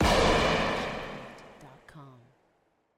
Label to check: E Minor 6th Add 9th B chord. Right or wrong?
Wrong